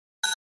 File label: Flash Beep HF Elect Dr, flash beep